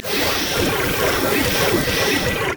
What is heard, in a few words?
hamstring; LoL; darius zaunknight